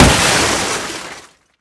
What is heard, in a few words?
crash